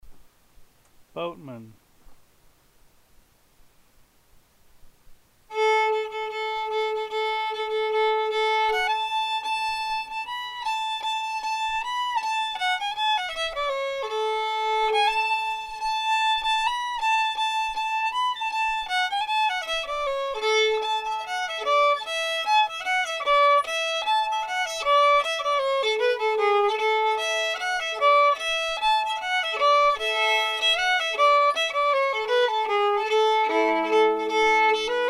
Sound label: fiddle; festival tunes